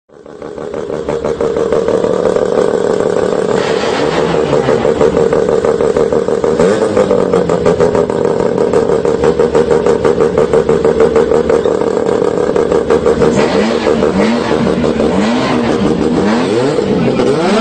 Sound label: RX7 ALS